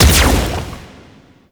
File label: Spin Beam Start; Enemies; Weapons; Warframe; Tau; Sentient Dactolyst